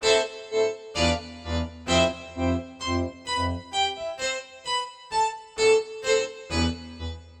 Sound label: Guitar Bright Guitar